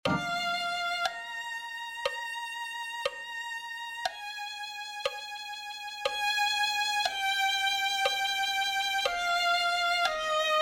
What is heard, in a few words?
violin